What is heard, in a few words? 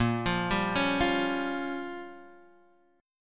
A sharp Minor 6th A sharp, Guitar chord; A#m6 Chord {X 1 3 0 2 1}; arpeggiated